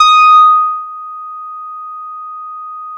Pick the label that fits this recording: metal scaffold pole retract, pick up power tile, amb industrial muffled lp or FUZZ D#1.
FUZZ D#1